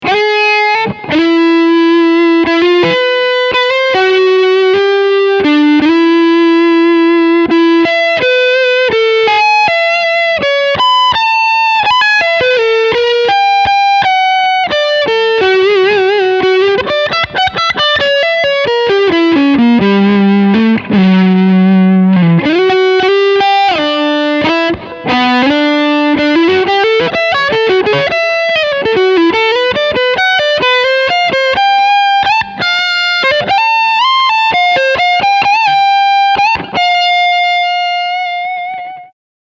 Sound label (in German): Leadsound Middle SC S1 ON